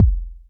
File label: Kicks, Elektron MachineDrum; SPS1 MKII